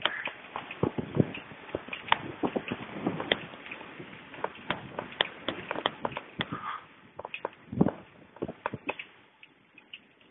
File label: foley